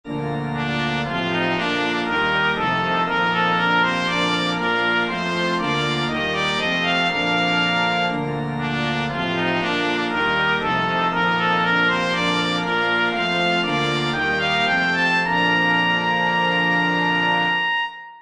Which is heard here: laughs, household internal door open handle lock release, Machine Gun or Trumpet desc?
Trumpet desc